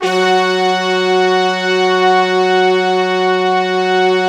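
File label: brass tutti, Brass Tutti octave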